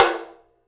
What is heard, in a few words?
TOM, Studio Toms; 3D Percussives